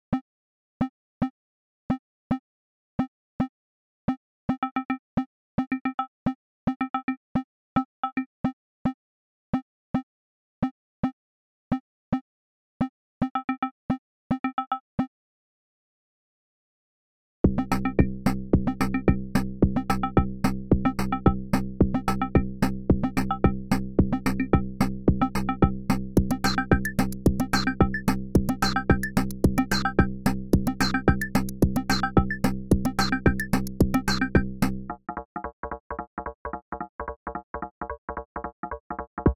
bird something reggaeton inspired

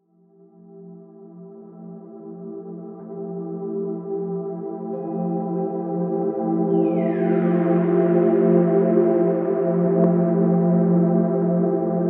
rhenium ambient; weekly beats 2020

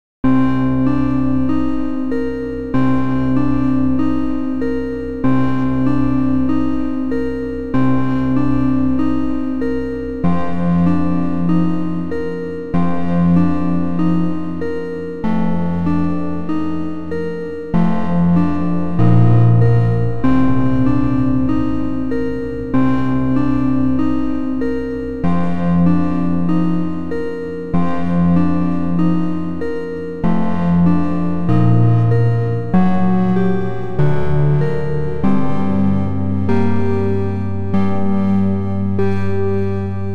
chiptune dungeon synth; weeklybeats 2024

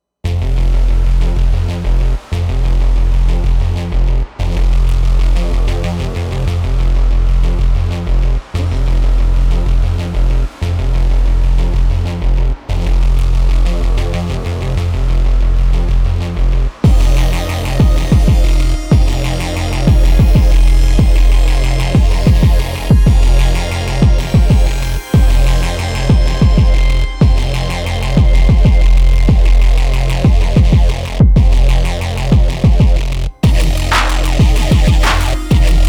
wildly overdriven electronics rhythmic; noise industrial tekknoise in 13 8th; bottom feeder

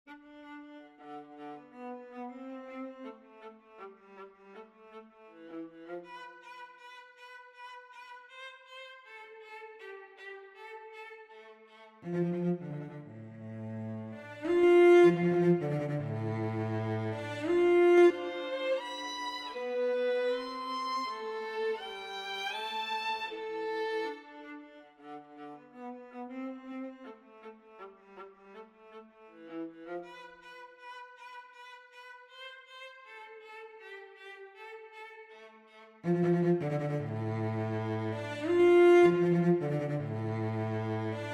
clarion call

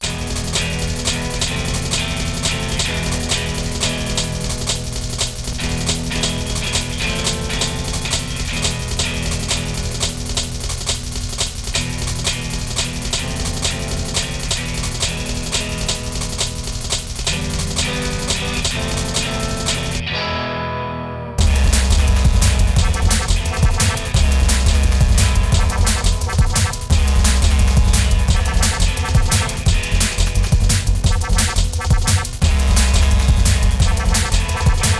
lsdj nanolopp2 guitar; undercooked interruptions; weeklybeats 2026

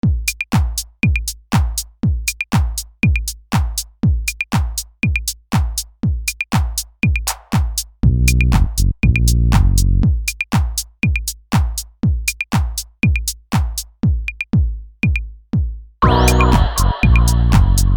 club beats

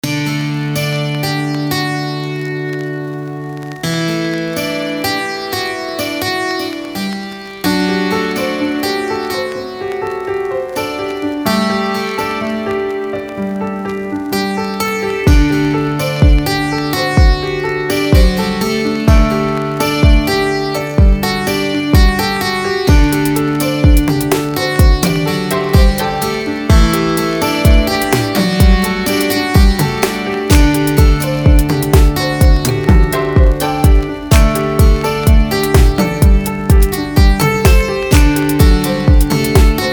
practice beat; weeklybeats-2020

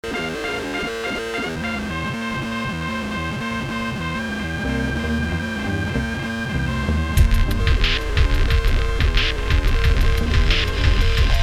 sensual insects

